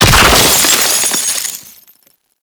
Sentient Column Destruction; Warframe; Tau; Destructible Dynamic

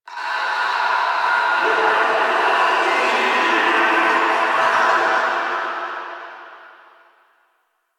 Distant Sewer Grate Screams; Infested; Warframe; Ambience; Nineteen Ninety Nine

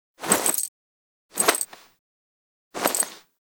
Head Shake Idle; Lab; Lyon; Warframe; Tau; Protoframe Room; Ambience

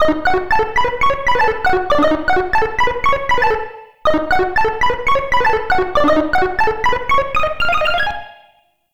Arcade Misc

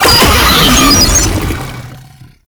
Proto Infested Brachiolyst Death, Vocals, Enemies; Warframe

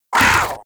Combat Vocal Attack Short; Warframe; Catbrow

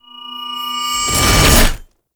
Strike Leap Charge Up; Boss; Enemies; Abilities; Warframe; Oraxia

